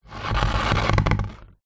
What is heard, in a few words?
plastic washing basket tub drag on wooden floor; foley